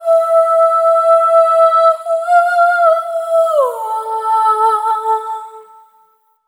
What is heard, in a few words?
Single Voice Melodic Loops, Vocal Samples; Pocket Diva World Class Vocal Samples